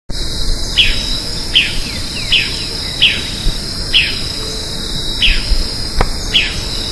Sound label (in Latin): Conopias trivirgatus